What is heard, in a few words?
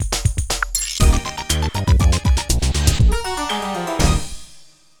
Stage Beaten; Hotel Mario; Hotel 1